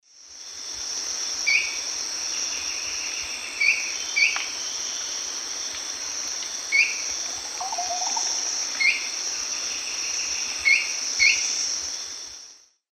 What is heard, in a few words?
Tyranneutes stolzmanni, call; S. America; Eastern Lowlands